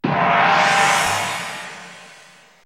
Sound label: SYNTH GONG; AMG - Vince Clarke Lucky Bastard